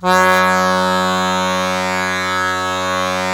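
DIATO F#3, diatonic accordion, Historical Instruments; AKAI; USB Soundscan vol.23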